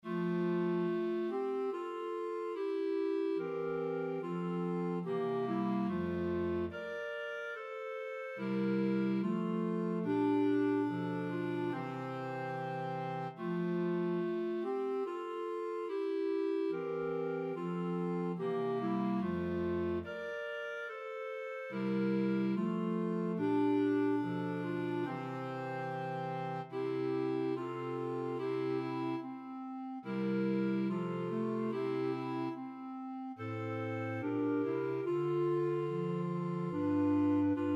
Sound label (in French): quatuor cordes; haydn joseph; empereur